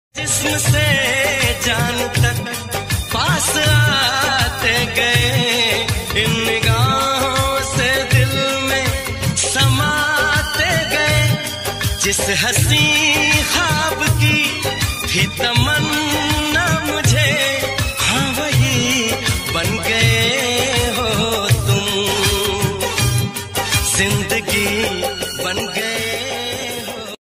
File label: Old Hindi Tune